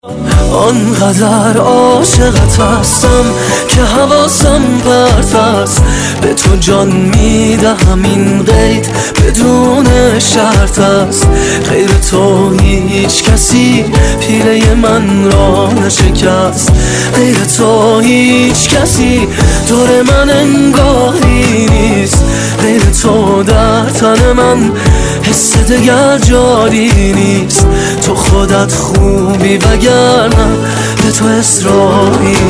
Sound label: Vocal; santoor